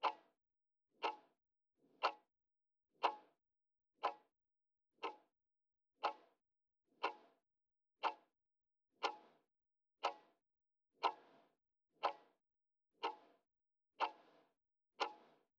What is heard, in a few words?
alarm clock ticking 60bpm